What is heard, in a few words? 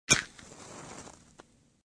Match Striking